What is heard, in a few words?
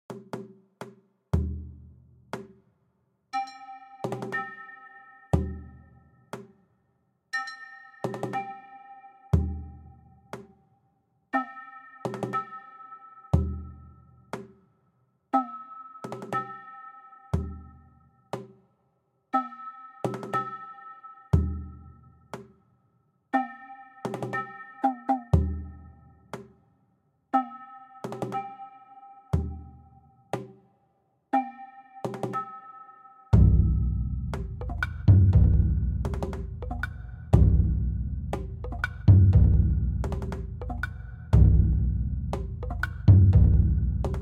(Percussion); B Path of the Teal Lotus; Crimson Bamboo Forest